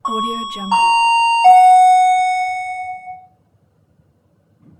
doorbell

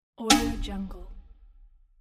metallic hit

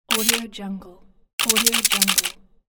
camera shutter

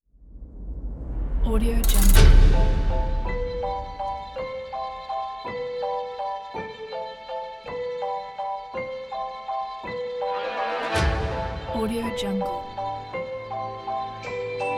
waltz of the bells

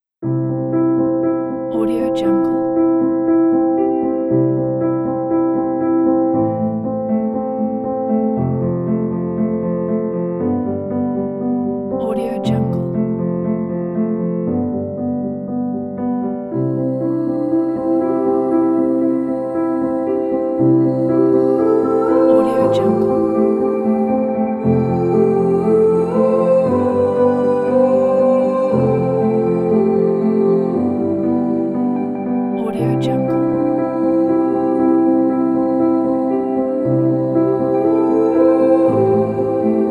emotional inspiring piano and vocals; stellartracksmusic

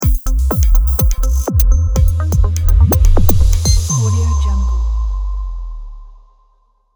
christmas logo

